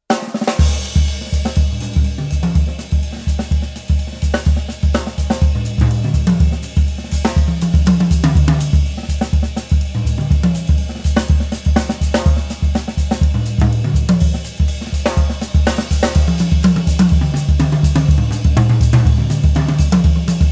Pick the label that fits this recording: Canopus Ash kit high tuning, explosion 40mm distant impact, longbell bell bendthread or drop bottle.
Canopus Ash kit high tuning